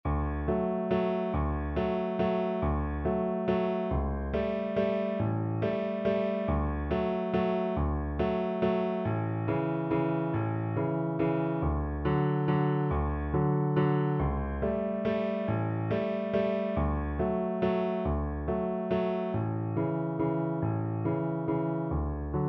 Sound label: verdi la donna, Double Bass